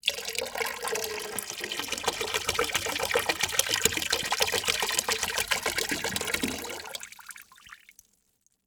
Liquid Water Pouring Into Water In Plastic Bucket Intermittent Long Fast